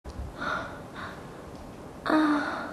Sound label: soft ohh